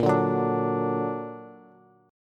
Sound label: Bb Augmented Bb, Bass; strummed; JGuitar